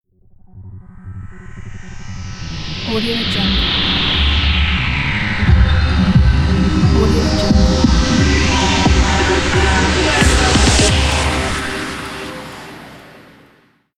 swoosh tension ident; happymusichappysounds

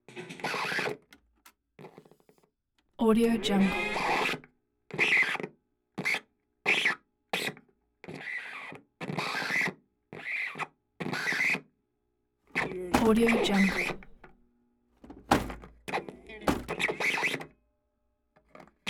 record player ge wildcat portable

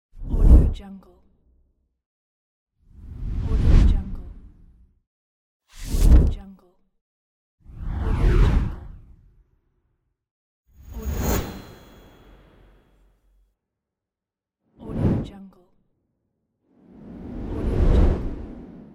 whoosh

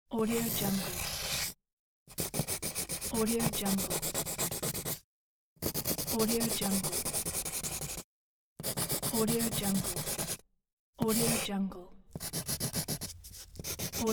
pencil drawing

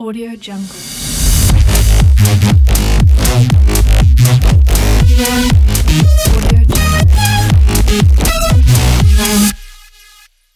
edm dubstep logo

